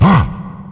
scythe swipe windup; death

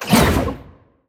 jump out; loki

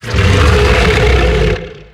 beast vocal grumble

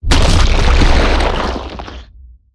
death melt; finalmother; motherphase2

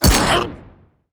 level up; bumbo

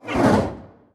death horse whoosh

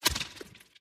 teratoma bounce small

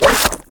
whip

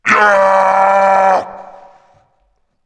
war dashing

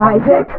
mom muffle

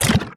lung barf; monstros

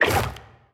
death lean

